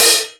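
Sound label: Suspended Cymbal Closed; Digital Sound Factory Virtuoso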